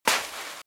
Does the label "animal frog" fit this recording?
No